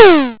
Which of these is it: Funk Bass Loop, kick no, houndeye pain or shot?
shot